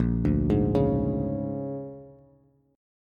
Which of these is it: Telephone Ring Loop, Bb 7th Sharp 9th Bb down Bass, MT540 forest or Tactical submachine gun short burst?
Bb 7th Sharp 9th Bb down Bass